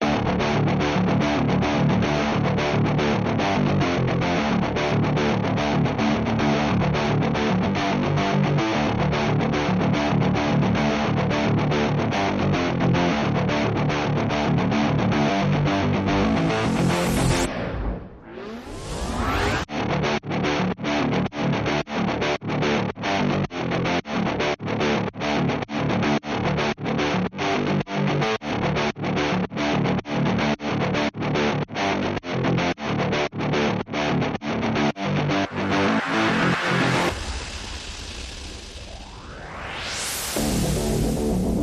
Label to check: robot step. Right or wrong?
Wrong